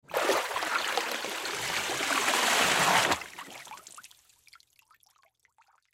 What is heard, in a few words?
Object Surface, Water Object Surface Splash; Water Object Surface Pull Out Slow; Splashes; Splash Sound Effects; Blastwave